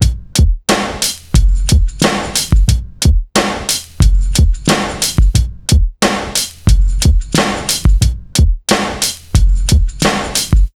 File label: 90 BEAT, Pure Electro; USB Soundscan vol.03; AKAI